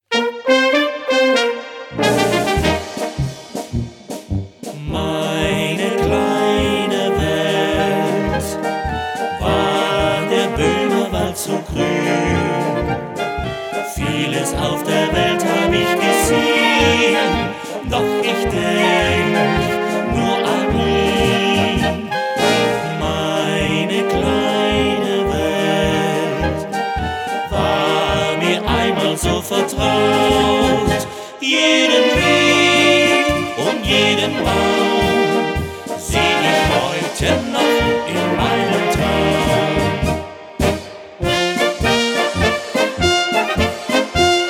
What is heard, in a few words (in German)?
Polka